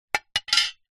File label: foley tin lid metal thin small set down surface